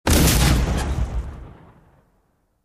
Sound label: Explosion Metal Deb, metal & glass